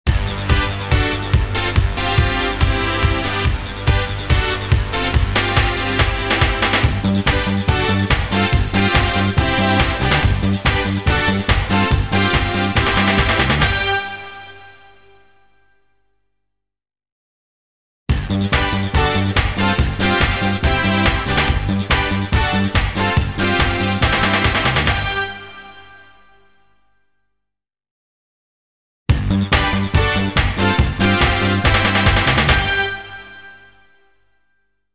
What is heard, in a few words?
Splash Cut; Sounders, Bumpers, CUES & IDENTS; DennisMusicLibrary